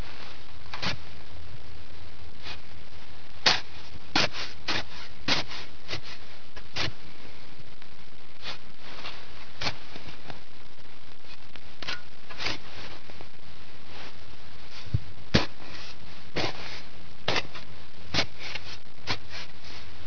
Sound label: planting; preparing for spring